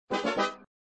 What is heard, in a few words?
Fanfare; flipper